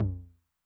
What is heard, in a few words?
HI TOM, Low Tune Long Decay, 909; classic machines; HT - HI TOM